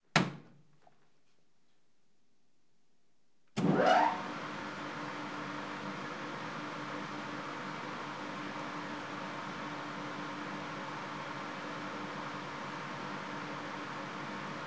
multi purpose motorised printing press rollers engaging then slowing; praga